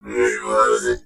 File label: robot mech vox